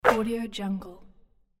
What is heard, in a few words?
basketball net